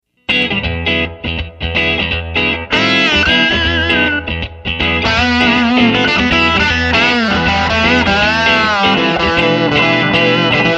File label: Both Input Rhythm Strat Neck Lead Strat Bridge then Neck